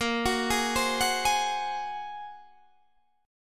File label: Bb 7th Suspended 2nd Sharp 5th Bb Guitar Open D, chord